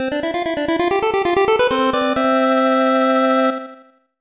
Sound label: Jingle; snes